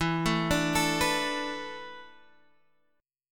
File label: E 7th Suspended 4th, Guitar, Half Step Down; E7sus4